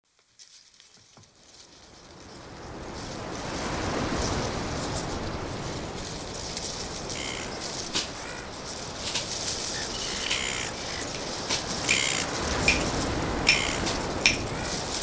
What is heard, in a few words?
Seabird soundscape